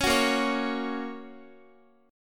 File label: Bb Minor Sharp 5th, Guitar; strummed; x,x,11,11,10,8; 4E,4A,5D,5G,5C,6F